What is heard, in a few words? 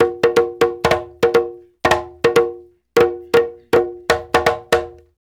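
break drum, Reggae; Spectrasonics - Supreme Beats - World Dance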